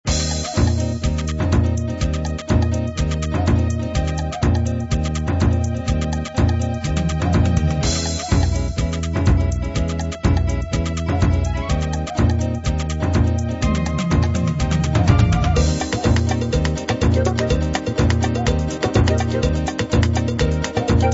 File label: brasil jazz